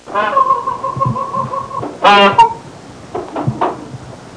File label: Peacock sound